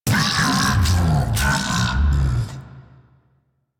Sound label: Hand Bomber Death, Battle Barks; Warframe